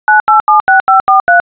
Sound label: tele number